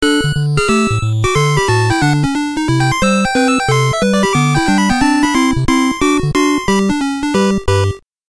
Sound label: nes jingle, ringtones; games